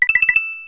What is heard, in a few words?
coin